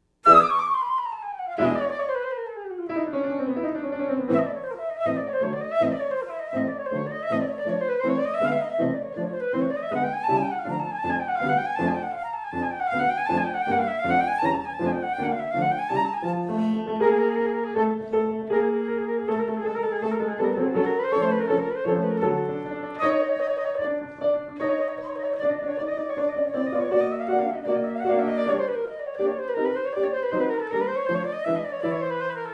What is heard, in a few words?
piano bumblebee